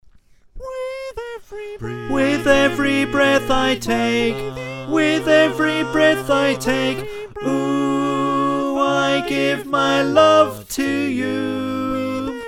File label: Tenor, choir